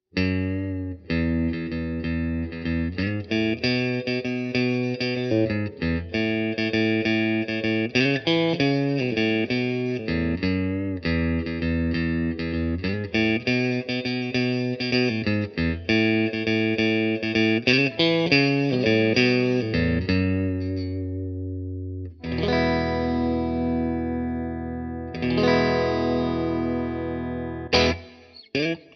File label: Middle Neck Clean